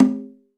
Low Conga; Alesis D4

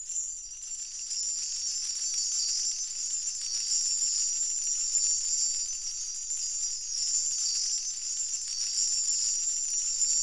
BELLTREE

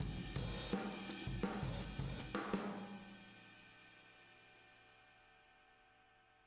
Boogaloo - Outro - Room M, Drums, 82 BPM

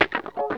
WAH SNIP, Funk Gtr & Wah

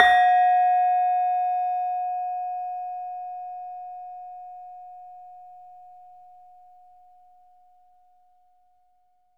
LAMEL F4, Asian Traditions; USB Soundscan vol.60; AKAI; LAMELOFO

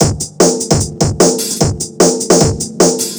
150 Phat Club Drums, 150bpm; Combi Loops; Nu Dance Electro

